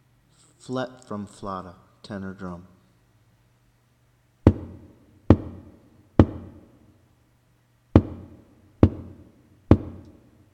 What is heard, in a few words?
Tenor; Flett From Flotta